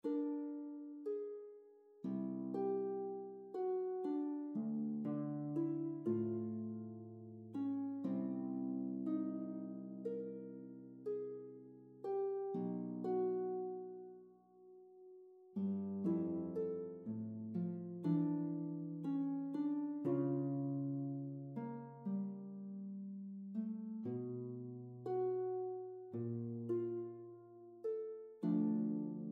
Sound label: LUTE, baroque lute; QUITE SOON; new compositions for baroque lute vol 2